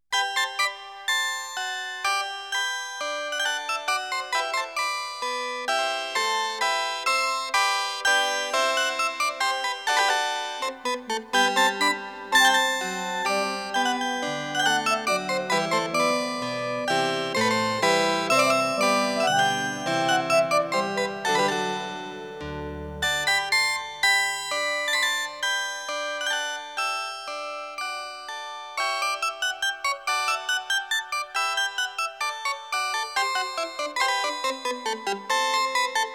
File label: Menuet